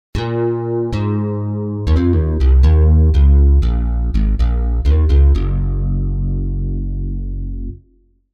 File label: Strato Bs; TURBO VL